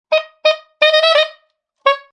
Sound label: A saxophone beeps.; Horns; Musical